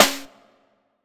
SD, Dance Drums

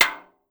Timbale Edge, Clavia DDrum AT, Pitched Down

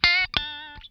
lick scale, funk guitar; Zero G - Funk Guitar

